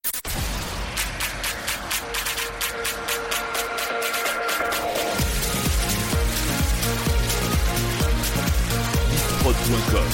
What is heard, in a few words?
Medley Electro 2010 IDM